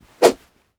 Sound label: pickaxe attack; Rust